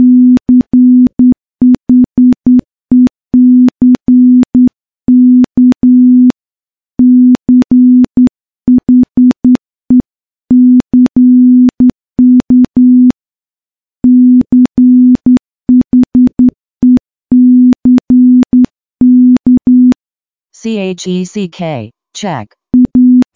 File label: morse training 10WPM